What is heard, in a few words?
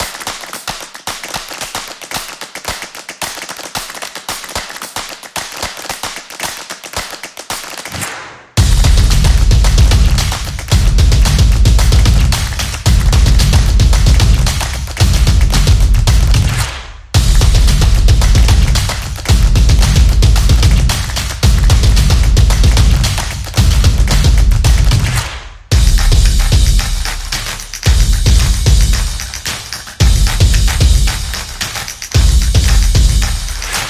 Energetic Intro